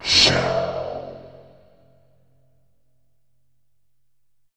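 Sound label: sssshh, tubevox wet; Q Up Arts; Denny Jaeger Private Collection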